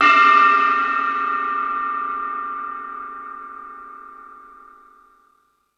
Thundadome, E-MU Pro-Cussion

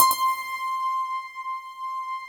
harp C5; PETERHARP; GABHARP; Synth

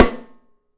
SN MAR HD, 3DPercussives; E-MU Producer Series Vol. 5 – 3-D Audio Collection

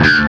HARMONC DRAG, Guitar; East West; Steve Stevens Guitar Samples Collection

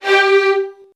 G2, VN 16TH, Virtuoso Strings; ILIO; Kirk Hunter

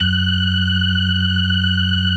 BLOOD OCT, Synth and Organ; InVision Interactive; Keith Emerson; The Most Dangerous Synth and Organ; P.BLOOD OCTV

